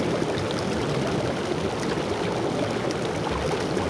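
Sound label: waterflow large ambience; Star Wars Obi Wan; XBOX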